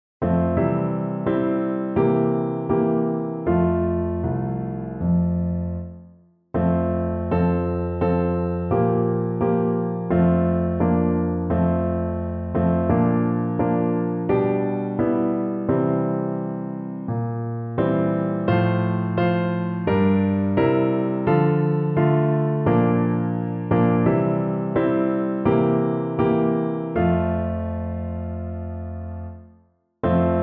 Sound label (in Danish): Hoej Klaver 4 stemmer; Nu taendes tusind julelys.